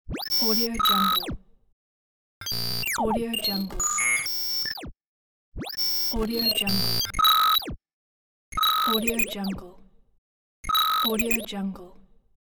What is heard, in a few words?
biometric retinal print